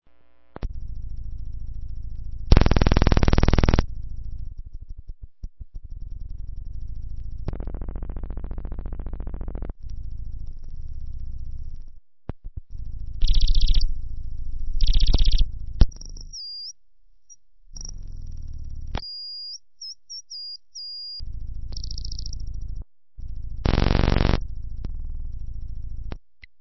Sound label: oxidizer self noise